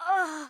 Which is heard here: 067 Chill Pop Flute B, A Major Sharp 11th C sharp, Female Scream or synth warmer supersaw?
Female Scream